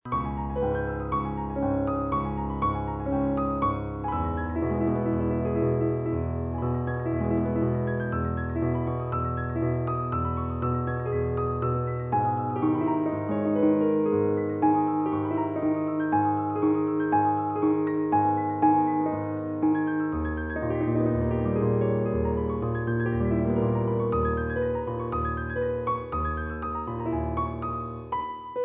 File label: Piano